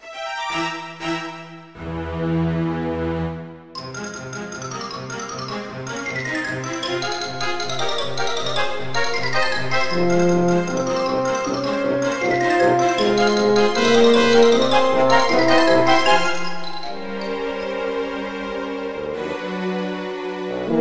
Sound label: mokkin konran Loop